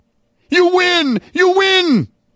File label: Game Win Game; kids-tetris